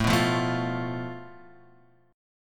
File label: A Suspended 2nd Sharp 5th